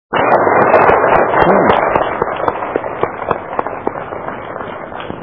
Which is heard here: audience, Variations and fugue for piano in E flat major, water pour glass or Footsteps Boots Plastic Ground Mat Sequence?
audience